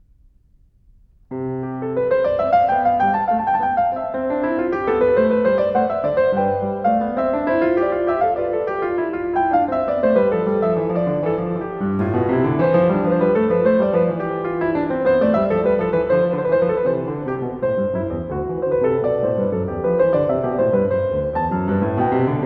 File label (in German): Zweistimmige Inventionen C-Dur, klavier; BWV 787; BACH; Inventions